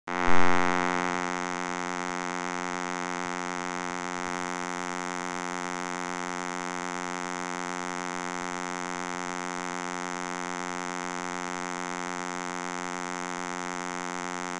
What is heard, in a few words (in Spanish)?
VCO oscilador forma SAW